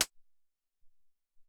Tek Hat, Alesis SR-18

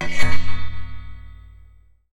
Raw HiHat, contact mic; musicradar; contact mic madness

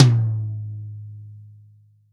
REAL DRUMS POP KIT

